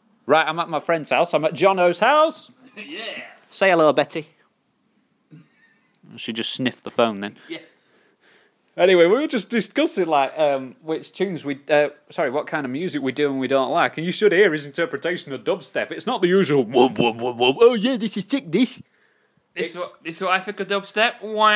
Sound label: dubstep